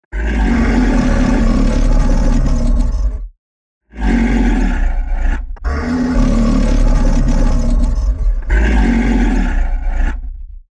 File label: Lion Roar